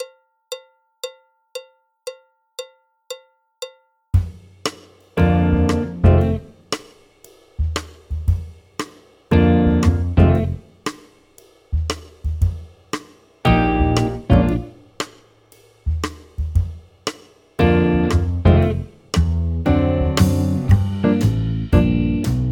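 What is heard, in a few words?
backing track, Jazz; alto, tenor, trombone, piano; Jazz Syllabus Level 2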